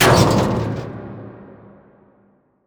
stomp sheet metal, antlion step

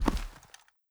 body impact soft

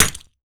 inc grenade bounce; weapons; Counter Strike Model Pack; CS2

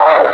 pain, suppressor

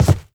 scuff, footsteps cardboard

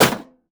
container damage; Counter Strike Model Pack; CS:GO; survival

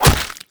attack hit, zombie; creatures

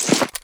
gear, combine soldier; Half Life Model Pack; Half Life 2

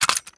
contents, ammo clip rapidfire

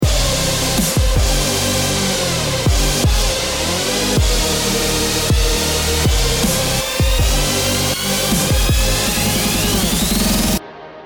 round ten sec count, music

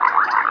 critter, canals; Half Life Model Pack; Half Life 2; ambient levels canals

